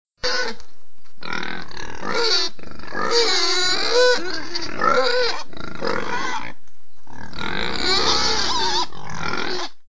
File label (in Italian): maiale; test riconoscimento versi animali